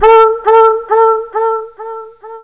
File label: HO HO HO; SoundFactory; FX